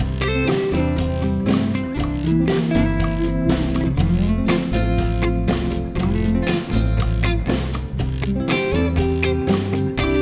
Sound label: neck pickup solo; tele; backing tracks